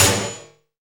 SNR FX SN, Snares; Roland L-CDX-01; Snares 6; Sn Modules 6

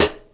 Vintage Closed Hi-Hat; Drum n Bass; Hi-Hat One-Shots; KJ Sawka; Drum n Bass & Beyond; Drum Tool Box

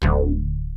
ACID BASS; Rubber Chicken Software Sample Bank

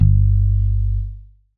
bass stab

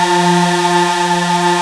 Prophet 5 F4; Hollywood Edge - Giorgio Moroder Rare Synthesizer Collection

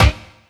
bass drum -R, 168 BPM Drum & Bass; Ueberschall; Drum & Bass Resonance

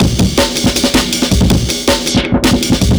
Break, Loops; M8; m8 breaks

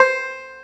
BANJO C5, ETHNIC; AKAI S-Series CD-ROM Sound Library VOL-8

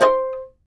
tar; Roland - LA Composer vol. 3

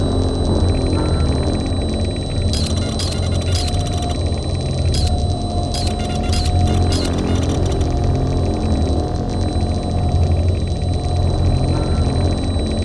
ATMOSFX; Best Service; Advanced Mega Effects

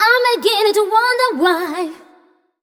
f mel f, fem Vocals; Mutekki Media; Diamond Vocals 2

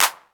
Nother Clap; Mo' Phatt; Digital Sound Factory Mo' Phatt

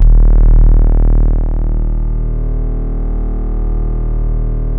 PHAT BASE, BASSES; Masterbits; Vintage Voltage

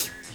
Dance Drums; Best Service ProSamples vol.15; AKAI

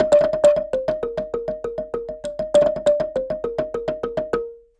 Marimba 100bpm Eb, African; Quazibeat; African Secret

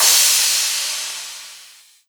Crash, Cymbals

